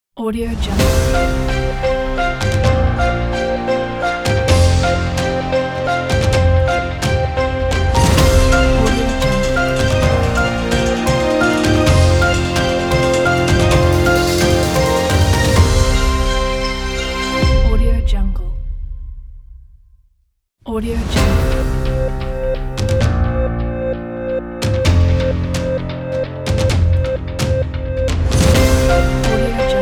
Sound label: orchestral news logo